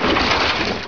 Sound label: magic